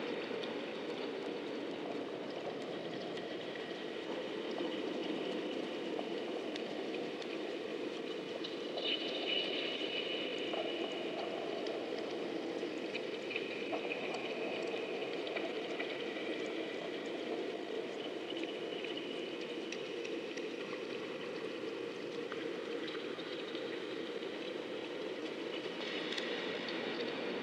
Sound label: Menu Ambience LOOP Boost